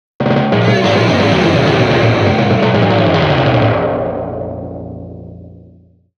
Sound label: Salmon Run Failure Jingle; Splatoon 3; Splatune 3